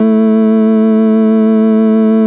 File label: FARFISA, A2, Vintage Keyboards